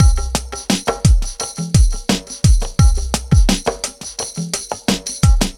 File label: Packet, downtempo beats